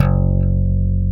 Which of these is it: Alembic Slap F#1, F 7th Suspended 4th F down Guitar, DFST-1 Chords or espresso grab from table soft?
Alembic Slap F#1